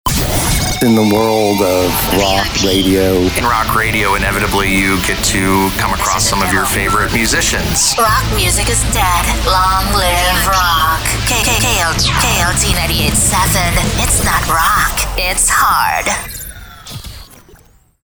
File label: ROCK Radio